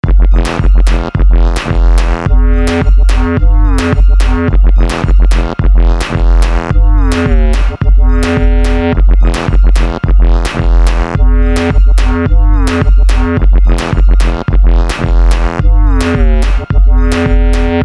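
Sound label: moombahton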